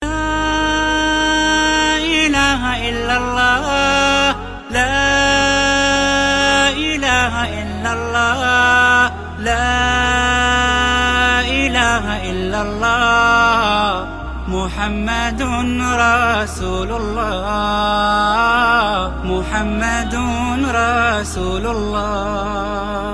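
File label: beautiful Islamic ringtone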